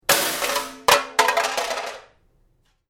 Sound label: Metal Crash Drop Debris Settle, Small metal junk crashing; Small metal junk crashing with good stereo definition. Pots and pans, metal parts imp; Drop Sound Effects; Lynne Music